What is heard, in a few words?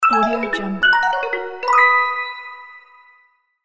cheerful you win melody